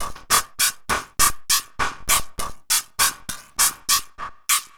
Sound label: Electronica Drumloop; Drums